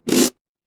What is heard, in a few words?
cartoon stretch